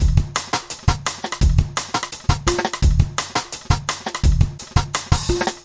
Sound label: clever layers, drum loops and breaks, 170 bpm; 161 to 180 bpm